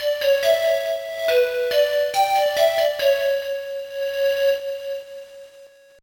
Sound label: Panpipes Stings; Chronicles of Narnia The Lion the Witch and the Wardrobe